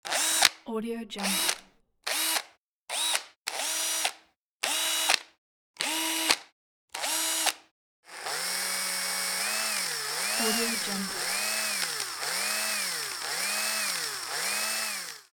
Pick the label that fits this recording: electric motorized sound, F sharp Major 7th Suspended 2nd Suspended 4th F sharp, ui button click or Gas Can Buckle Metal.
electric motorized sound